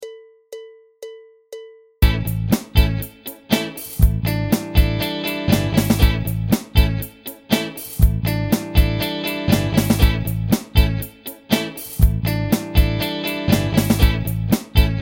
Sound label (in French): debutant groove